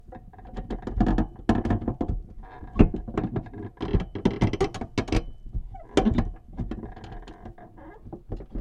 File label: Squeaks Touching